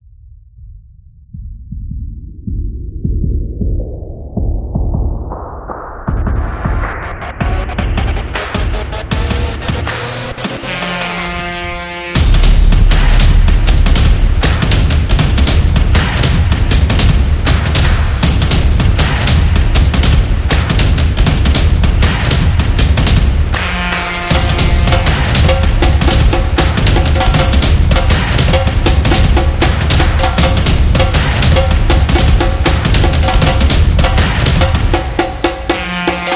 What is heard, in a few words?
The Football Action, percussive; percussive nation vol 4